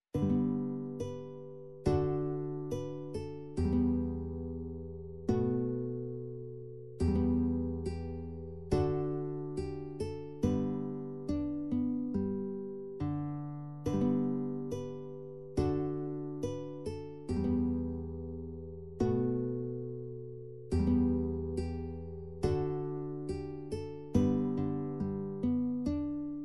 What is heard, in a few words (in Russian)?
Pastyrj dobryi ishet gitara